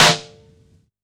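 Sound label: Vinyl Snare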